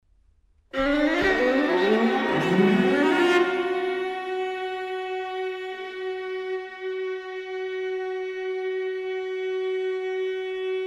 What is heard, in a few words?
microludes for string quartet